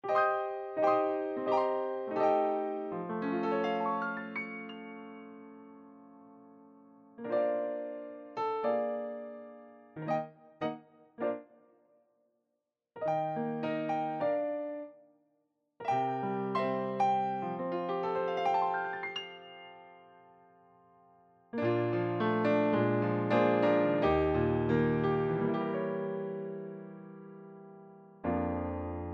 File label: No Vocal; Callback Piece